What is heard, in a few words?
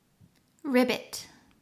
ribbit